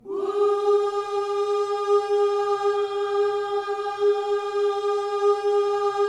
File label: WHOO G#4, Vocal Gospel; WHOOS; ILIO; Vocal Planet